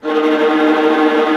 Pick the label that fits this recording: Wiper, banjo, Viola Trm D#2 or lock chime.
Viola Trm D#2